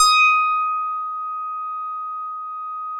D#6, Bass; E-MU Producer Series Vol. 6 – Analogia Project; Boom da Bass

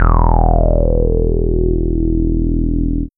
FILT BASS; BASS SYNTHES; AKAI; Big Beat Samples & More

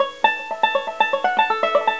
Banjo A; Foundry (Sony Creative Software); Hip-Hop Street Beats Construction Kit; ClassicCountry

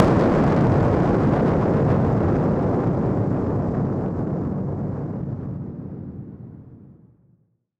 Noise Bomb Harmon; musicradar; bomb factory

